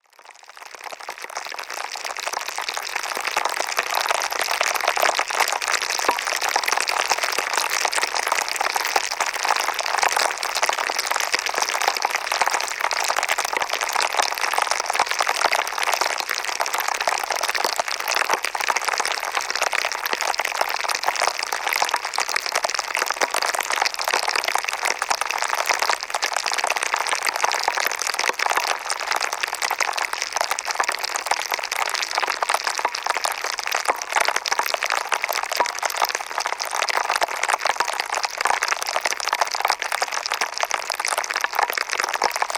pop rocks hydrophone in a jar

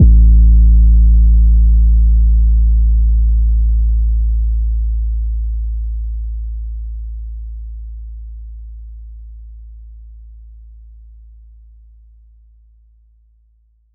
phonk kicks oneshot 808 a

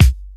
shiny kick drum one shot c key

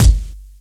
rich high end kick drum sound g key

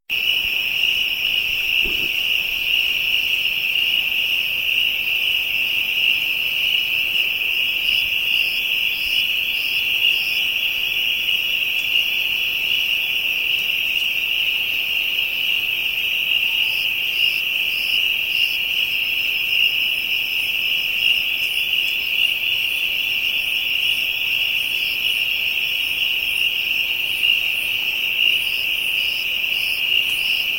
african insects ambient

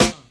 bright snare sample a sharp key; nineties

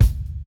old school mid heavy steel kick drum f sharp key

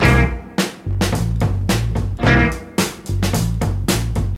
109 bpm drum beat c key

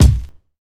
subtle reverb kick c key

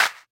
original hand clap sample g sharp key

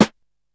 00s rich low end steel snare drum g sharp key

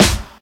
airy steel snare drum sample e key; snare drum samples

